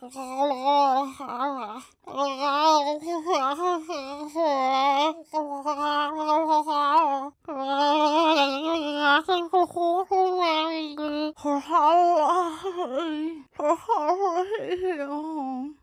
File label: Junk Fluke Long Loop